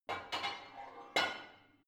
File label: barbell heavy plate put on